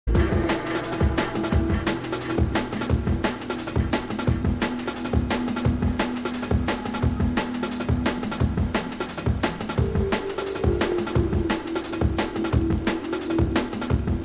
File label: Drum and Bass Jungle and Old Skool, UK Bass Radio